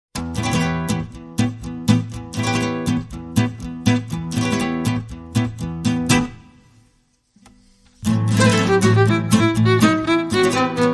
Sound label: Guitars and Violin